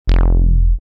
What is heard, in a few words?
Bass Pluck